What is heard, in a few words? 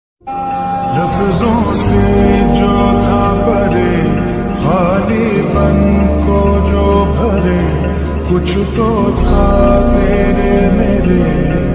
slowed and reverb bgm ringtone